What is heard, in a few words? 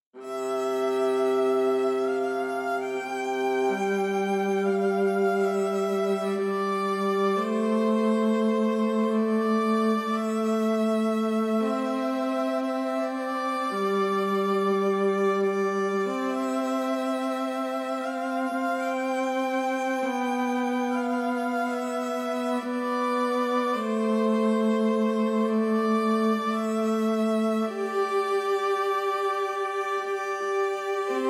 Emotional Viola Delicate Sustain